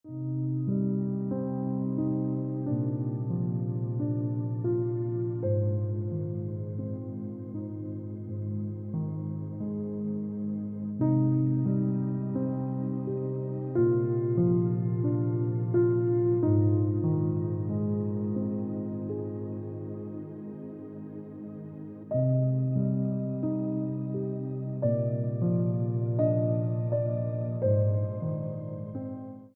Piano Music

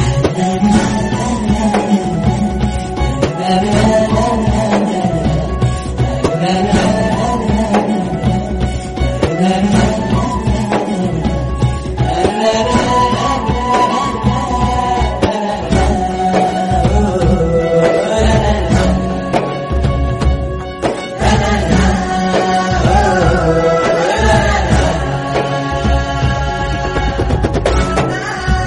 BGM Ringtone; Aadukalam

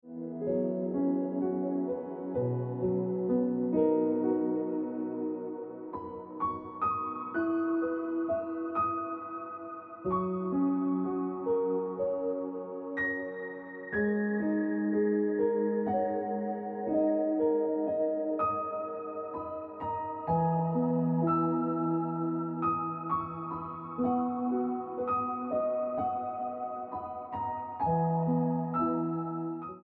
Piano Music

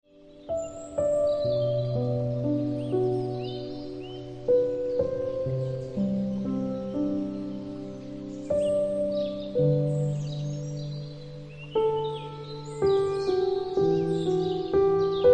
Ringtone Piano Music

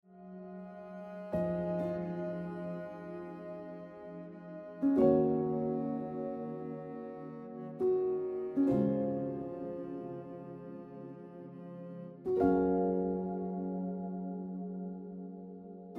Piano Music

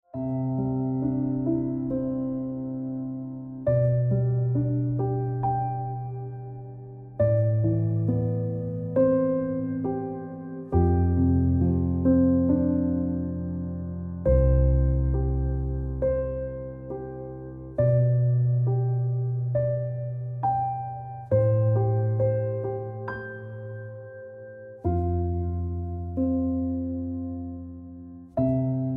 Ringtone Piano Music

